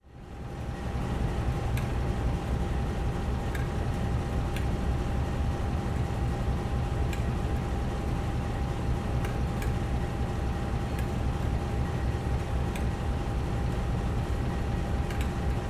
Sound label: train engine; blenheim road